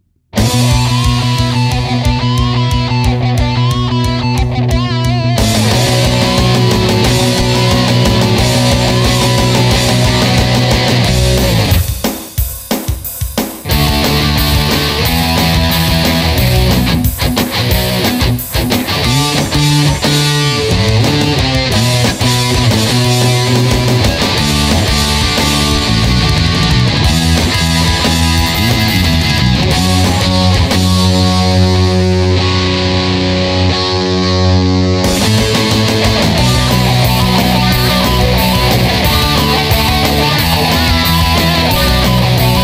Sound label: roller coaster inst